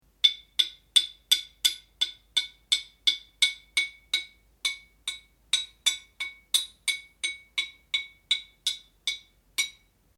Hammering On Metal, Repeated hammer strokes on hard metal; can also be hammer and chisel; Metal Sound Effects; Materials